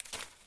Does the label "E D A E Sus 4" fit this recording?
No